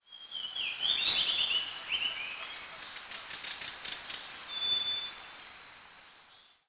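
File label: jungle ambience; Real War: Air Land Sea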